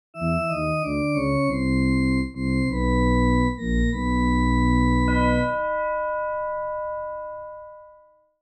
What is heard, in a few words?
Game over you lose horror